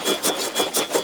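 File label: Long Blade Projectile Rune Throw Loop; Powers; Runes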